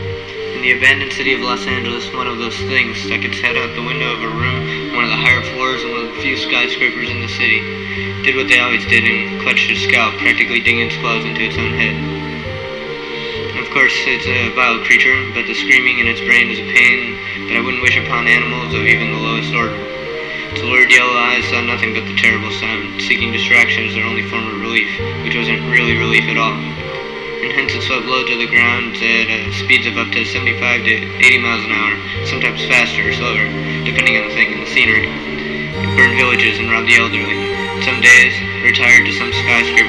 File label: screaming brain